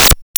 Crisp Snare Zap; Cwejman S1; DevSnd Cwejman S1 - JK

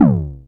FX Analog; Sound & Vision - Gigapack II

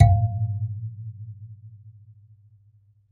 AFRBASMP, BASSE AFR; Univers Sons - Raricussions

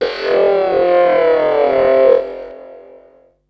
FX, Drum & Bass

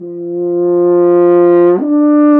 brass, F.Horn Solo; F.Horn FX; Intervals

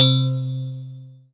SYN, Roland, digital; Sound & Vision - Gigapack I CD 2

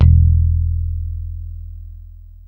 ROCK, PICK BASS

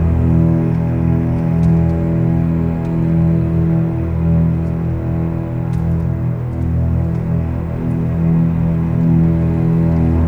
Cinematic Strings, Orchestral

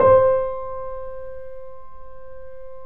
C, The Piano; InVision Interactive - The Piano; BOESINGSOFT

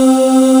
WS AIRVOX; CMP - Analogon

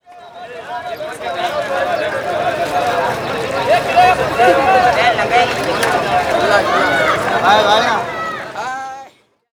BOMBAY, AMBIENCE, Indian Traditions; USB Soundscan vol.45; AKAI

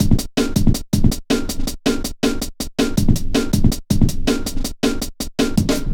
metallic stepz, drum loops; Zero-G Jungle Warfare 1, 2 & 3

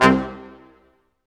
BRS Quintet staccatos, Symphony Orchestra; Roland LCDP08

